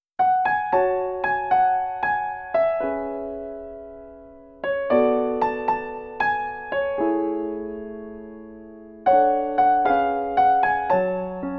Piano Arrange Version; solfege vocal collection

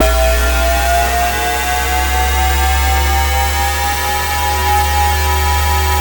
Airplane; Phantasy Star; master system

